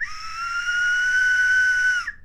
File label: Sustain High, slide whistle